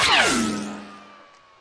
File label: scout jump; video games; source engine; tf